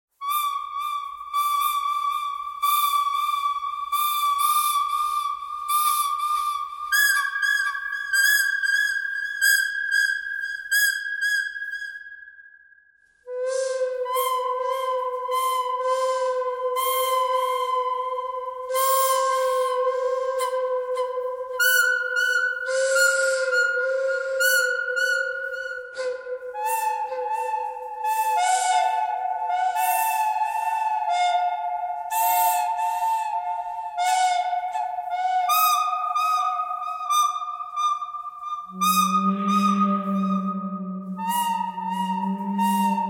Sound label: bird canyon thread harmonic